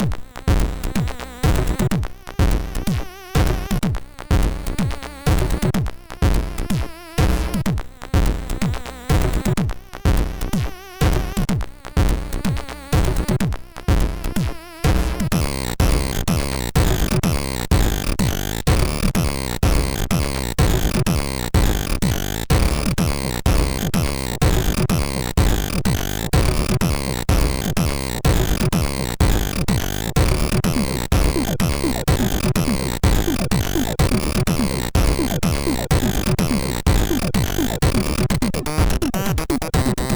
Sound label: Industrial sid MOS6581R3; Astovel